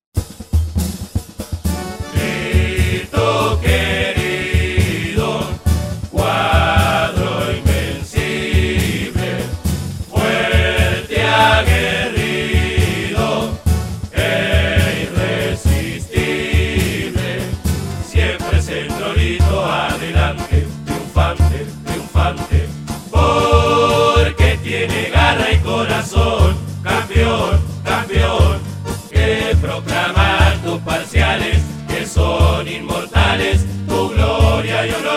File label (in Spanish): himno